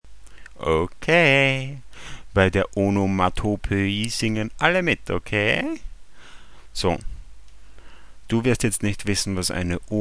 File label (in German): muuuh